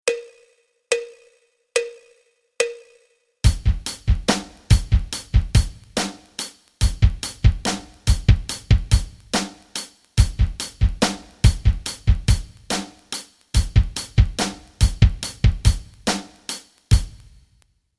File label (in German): rock rhythmen